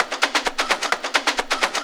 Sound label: percussion, 130, drum loops